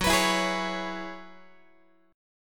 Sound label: F sharp 7th Suspended 2nd Suspended 4th, down, Guitar; x,14,14,14,12,12; strummed